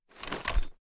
inspect; gmod

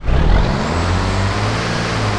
first, vehicles

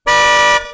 car horn; gmod; sligwolf

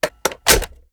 reload empty mag in

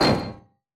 frag physics bounce riotshield; gmod; viper

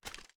inspect

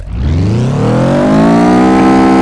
1st gear, vehicles; blista

